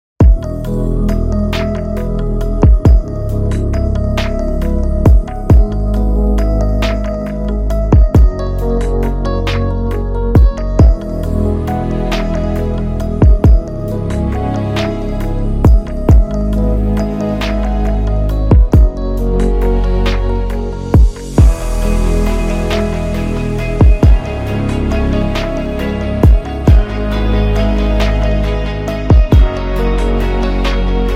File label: Cinematic Lofi